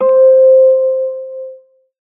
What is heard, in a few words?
Notification